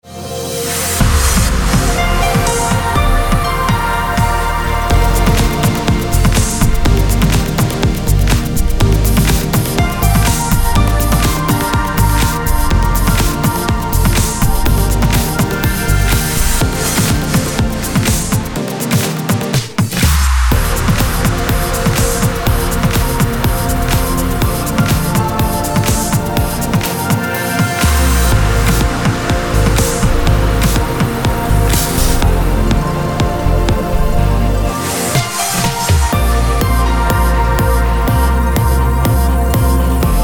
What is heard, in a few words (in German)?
Bumper und Musikbett Wetter; RADIO OBERLAND